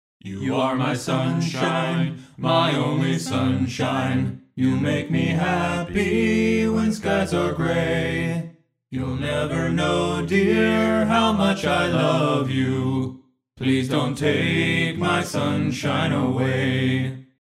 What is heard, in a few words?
Barbershop; Soundiron Questionably Barbershop